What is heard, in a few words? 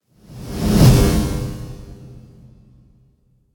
Action, Trailer Whooshes; Federico Soler Fernández; Effective Trailer Whooshes